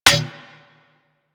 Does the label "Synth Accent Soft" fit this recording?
No